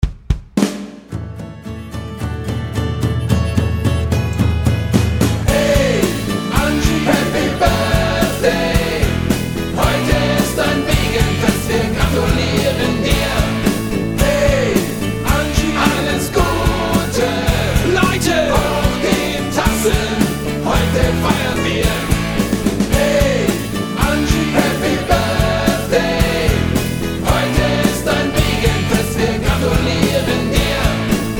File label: Happy Birthday Anschi